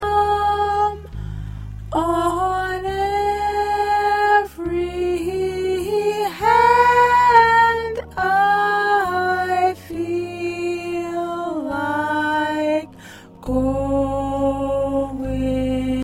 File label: SATB Soprano